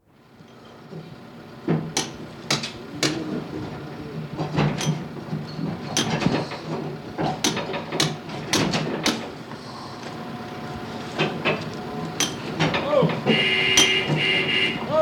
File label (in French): grutier klaxon voix, chantier; Culture sonore